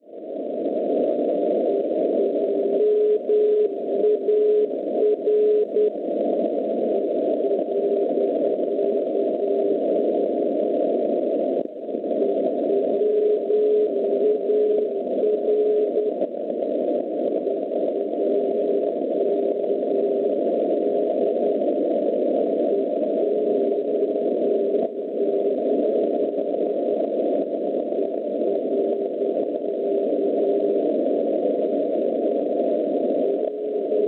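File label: non-directional beacon 383 kHz; ERK; Erken; Svezia; Schwarzsee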